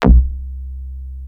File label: Access Virus B; Synthworx